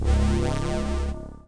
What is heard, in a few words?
zzzt